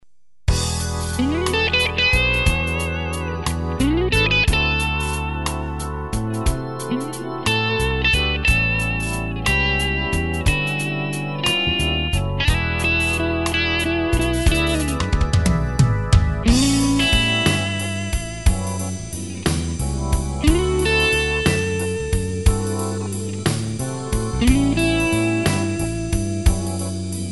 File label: Tweed and Hot Rod